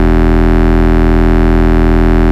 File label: Root E, Bass